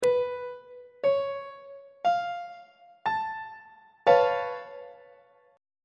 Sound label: d flat augmented 7th 3rd inversion on treble clef